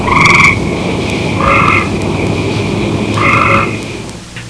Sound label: gray cricket, frogs